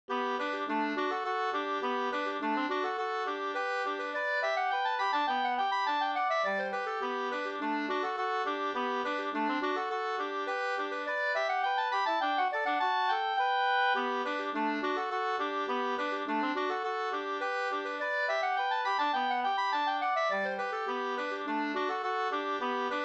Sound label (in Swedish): slangpolska 2st